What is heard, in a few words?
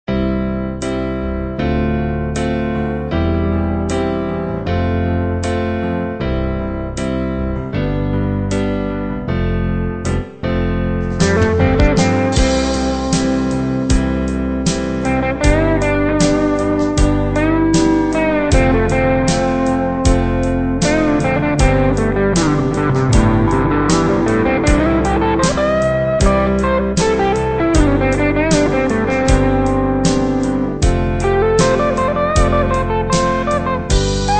guitar